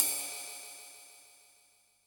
RIDE, Yamaha DD-65 CYMB

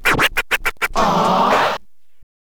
scratch kit, vinyl

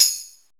TAM; E-MU Formula 4000 Series Vol. 1; Hip Hop Nation; Bonus Perk

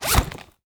Zipper, household rhythm samples hits

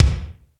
Roland U110 Rock Drums C1

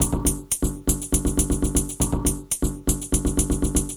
Kadhai Beat, Electronic Beats; Sonic Foundry (Sony Creative Software); Myths of Technology

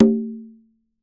CNG G O H, congas tuned; Q Up Arts; Denny Jaeger Private Collection

